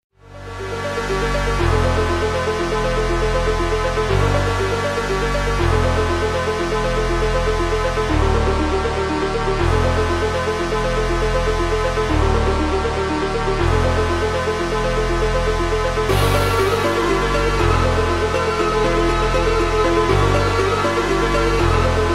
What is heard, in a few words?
Calm theme; Teapot Paradise